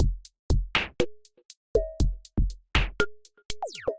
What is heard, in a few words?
Wicked Kit, Drums Synthesized; Ableton; Factory Packs; Drum Essentials